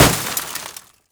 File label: crash